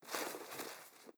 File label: pumpkin plant gib; Rust